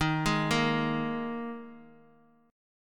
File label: D sharp Suspended 4th, Bass Standard; arpeggiated; chord; JGuitar